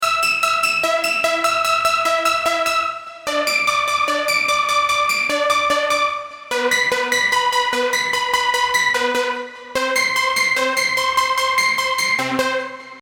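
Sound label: Bpm74 E minor Pluck Synth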